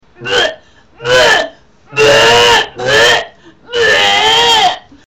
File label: puking; acted out seasickness for everyone on the ferry to hear